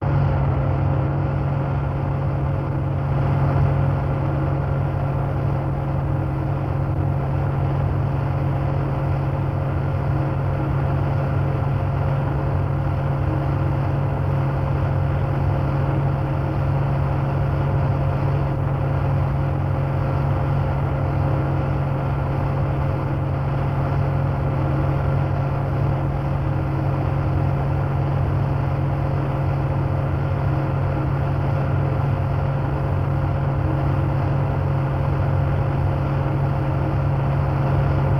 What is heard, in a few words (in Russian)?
zvuki kamaza